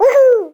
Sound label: Woo Hoo